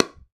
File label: PickelballPaddle Hit Ball Medium